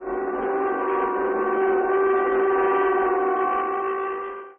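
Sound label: airplane movement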